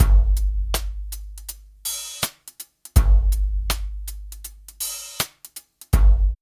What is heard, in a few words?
DRUMS, Funky Ass Loops; East West